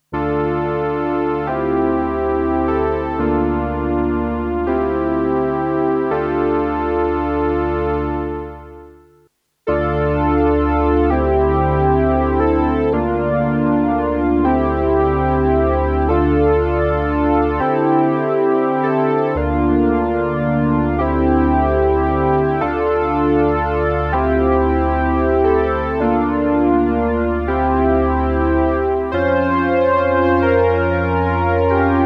Yamaha SPX90 Chorus Synth

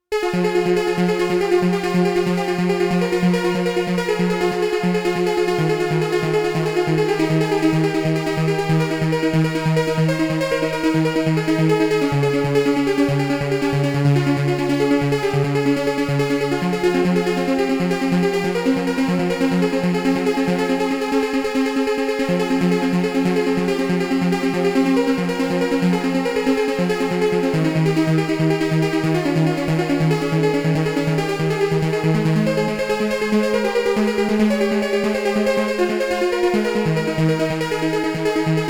JP 8000 Trance; Single Super Gemini

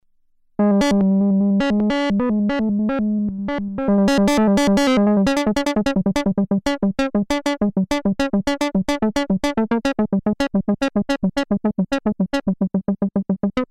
Plucked Guitar

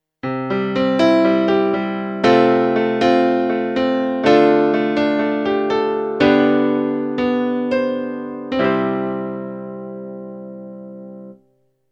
Grand Piano; AKAI MPK Mini Play Mk3

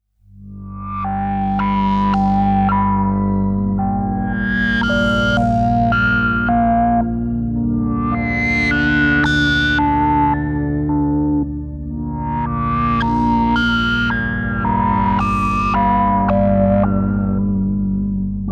Nina Pad; Melbourne; Slo Morpheen

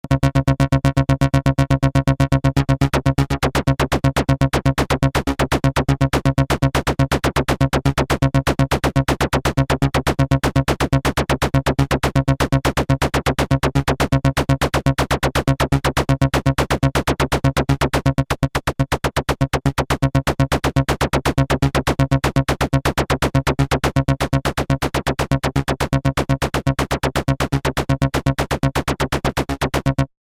Sequercizer Patch Modulation

